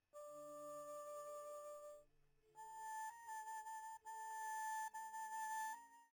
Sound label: melody